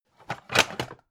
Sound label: pan take hard